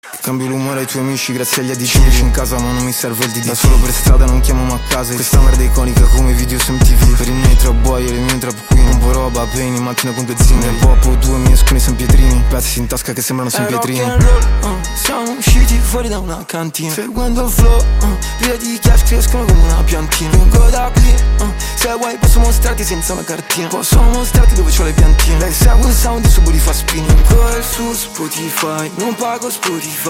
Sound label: rocknroll